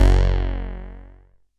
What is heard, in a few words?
JUNO BASS